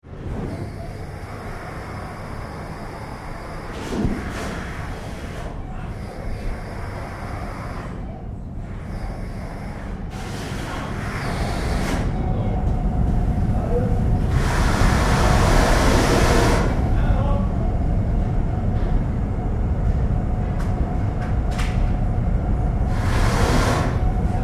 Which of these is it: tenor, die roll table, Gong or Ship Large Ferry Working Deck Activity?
Ship Large Ferry Working Deck Activity